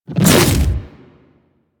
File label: Melee Swipe; Enemies; Warframe; Infested; Grey Strain; Splitter